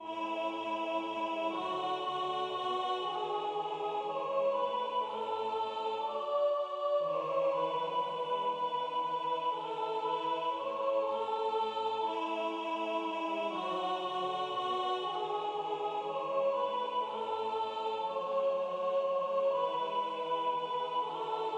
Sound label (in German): Sopran; O bella Bleniesina